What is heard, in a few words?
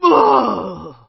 guard death; lego star wars; bespin